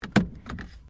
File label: CAR TOYOTA COROLLA HANDBREAK OFF, hatchback; 2009 Toyota Corolla hatchback; Cars; Vehicles; SoundEffects-Foundation1